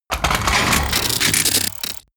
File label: robot transformation metal